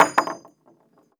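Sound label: bullet case bounce 50bmg wood hollow; gmod; viper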